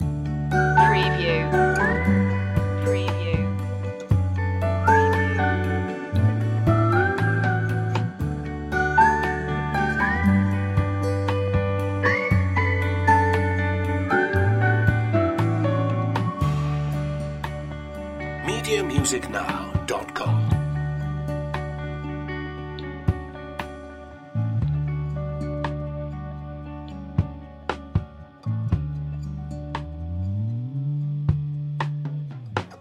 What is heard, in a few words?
simple thanks loop